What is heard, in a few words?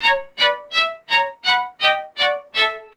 descending sneaky pizzicato string notes; cartoon